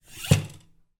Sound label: household kitchen knife pull out of holder